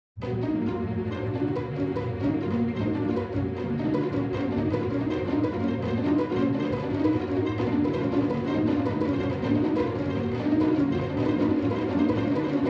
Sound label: Aleatoric Pizz Strings